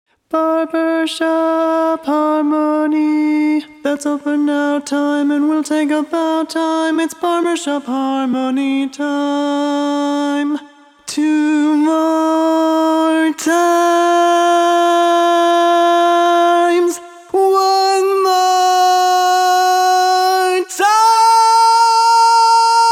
Lead, barbershop

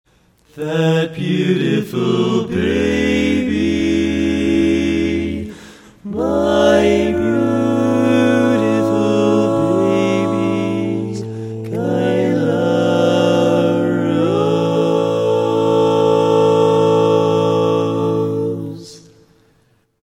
All Parts, barbershop

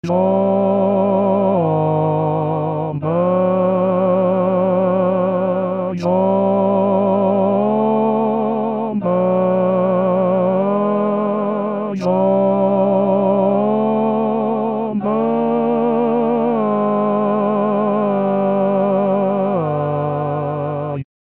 Bari, Barbershop

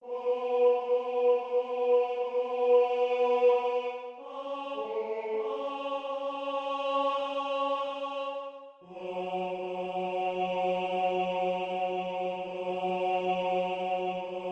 Lead, barbershop tags

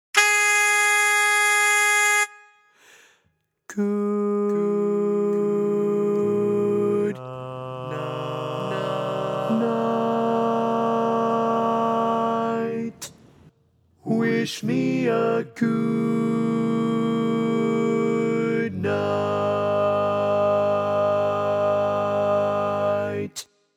Tenor, barbershop

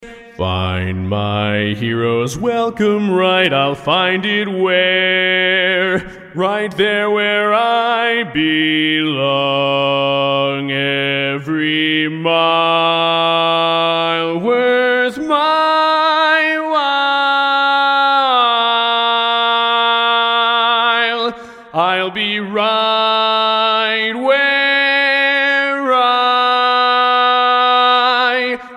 Bass, barbershop tag

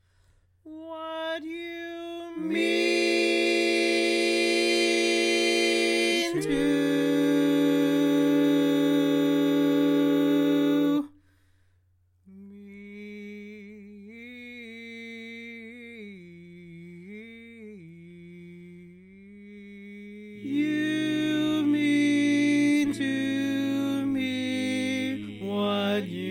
Bari, barbershop tags

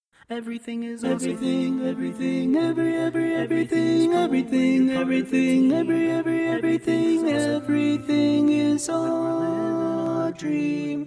Bari, Barbershop

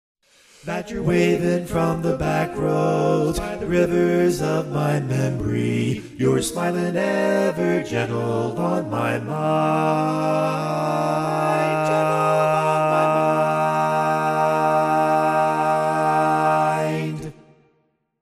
Bass, barbershop tag

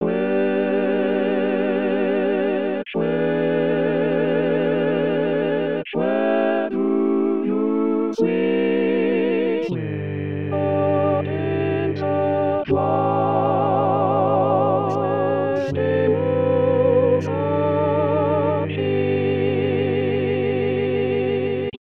All Parts, barbershop

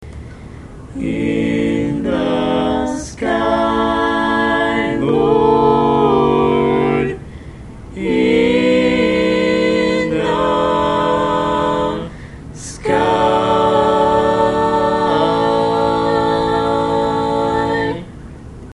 All Parts, barbershop tags

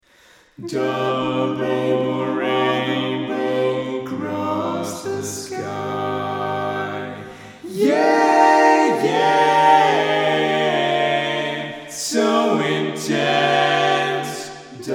All Parts, barbershop tags

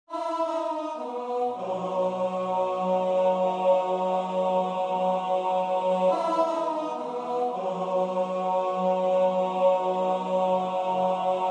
Lead, barbershop tags

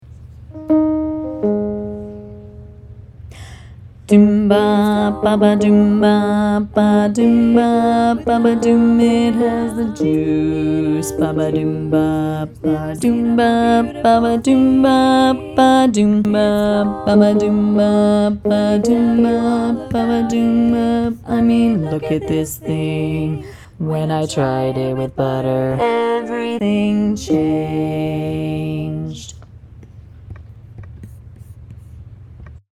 Bass, barbershop tags

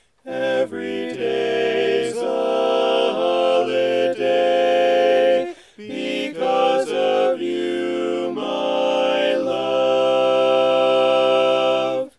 Lead, Barbershop

